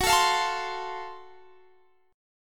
F sharp Minor Major 7th Flat 5th F sharp down Guitar Standard; strummed